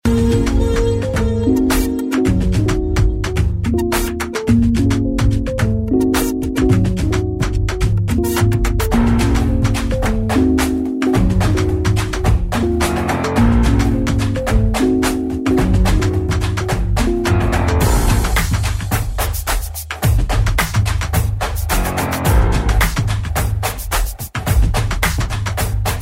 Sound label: Moody Beats